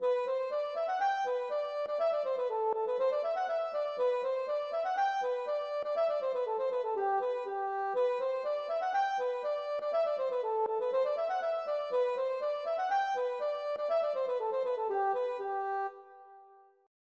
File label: polka